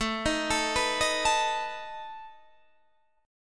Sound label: A Suspended 2nd Flat 5th, Guitar, Standard; Asus2b5; arpeggiated; 17,18,19,16,16,17; JGuitar